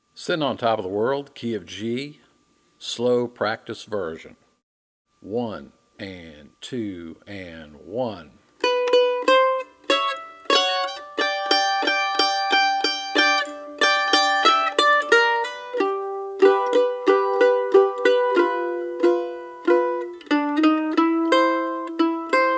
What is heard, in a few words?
Key Of G Practice Version